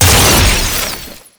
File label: Explosive Impact; Warframe; Weapons; Acceltra Prime